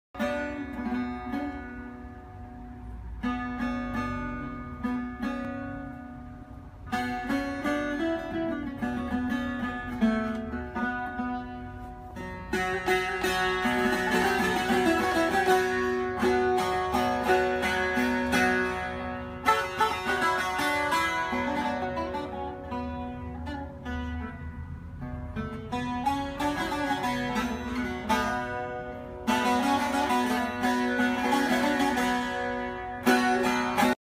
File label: Shurangiz, ساز شورانگیز